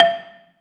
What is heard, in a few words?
BELA2 E4, BELAFON, Asian Traditions; USB Soundscan vol.60; AKAI